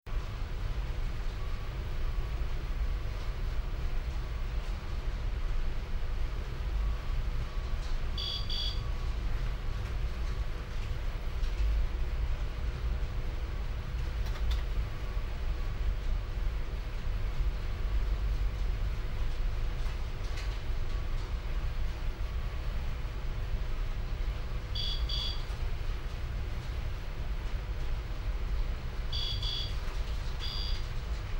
Industrial Mechanical Quarry Tarmac Control Room Computer Beeps Light Operator Movements; Construction